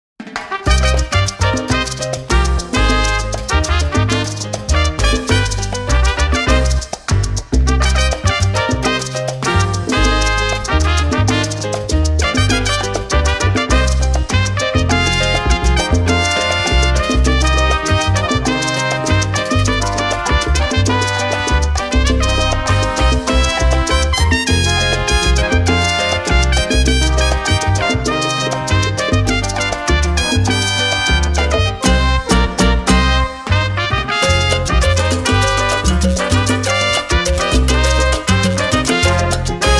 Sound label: Salsa Ringtone